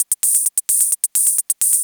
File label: hihat 2, 130, drum loops; Ueberschall; Techno Trance Essentials